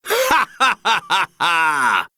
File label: laugh; kaiba